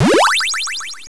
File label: picked; svencontra2